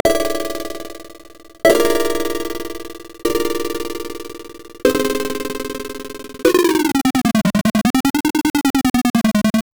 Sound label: a short snappy chiptune